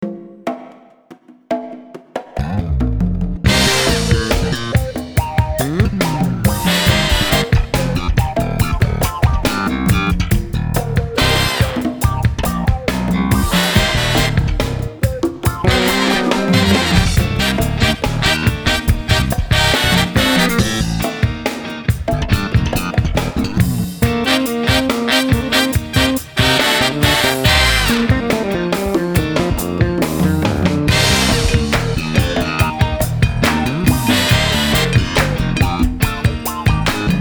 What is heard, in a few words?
feel the funk